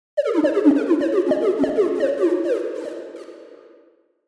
am thanh mat song mat ket noi phim vien tuong

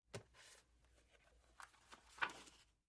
cầm lấy lượm nhặt cuốn sách tập vở

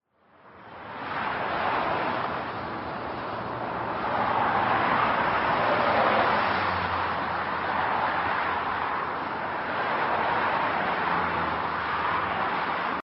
tieng xe o to chay qua lai tren duong

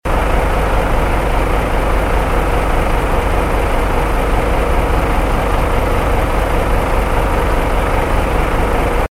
tieng dong co xe tai chay khong tai

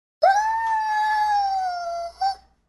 cho hu cho san labrador

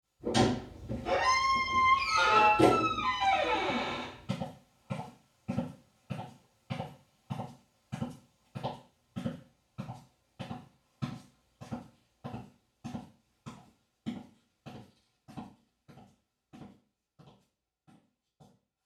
am thanh tieng mo cua tang ham ra va am thanh tieng buoc chan di bo

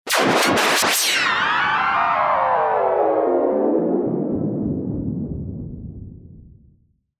am thanh quay nguoc thoi gian

